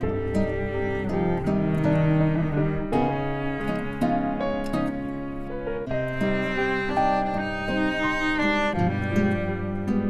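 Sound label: Everyday Piano Music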